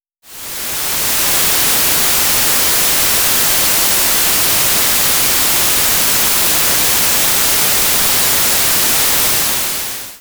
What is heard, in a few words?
white noise